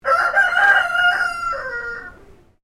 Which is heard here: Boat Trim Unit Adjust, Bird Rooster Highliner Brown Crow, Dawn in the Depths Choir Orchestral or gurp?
Bird Rooster Highliner Brown Crow